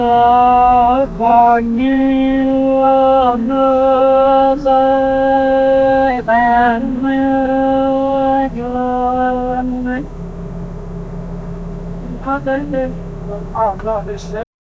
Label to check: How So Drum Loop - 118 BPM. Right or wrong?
Wrong